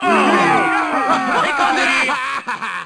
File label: foul, Crowd; French